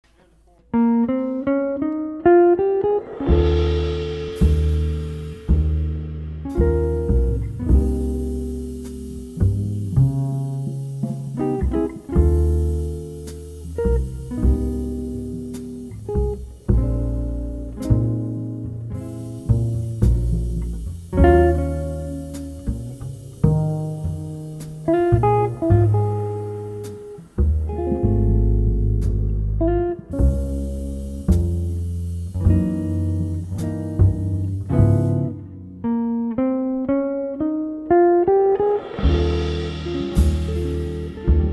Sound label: Guitar, Bass, Drums